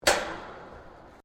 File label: METAL IMPACT CLANK